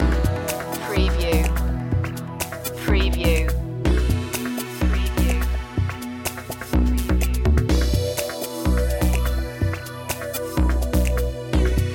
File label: loop, chill